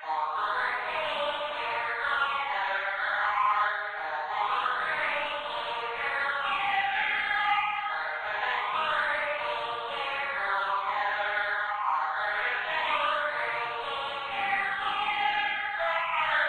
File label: spoopy wave; Undertale